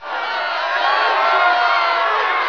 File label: win; assault